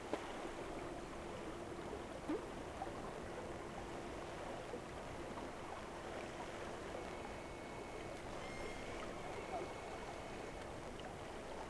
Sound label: Whales; SeaSnake